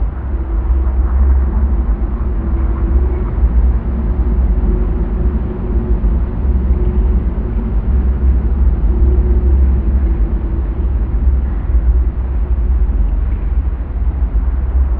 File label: drone; ゆめ2っき